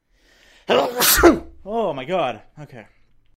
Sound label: Sneeze